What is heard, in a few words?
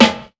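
snare, drum kits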